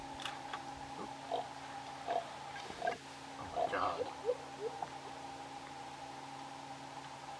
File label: Goliath-heron, پرنده غول حواصیل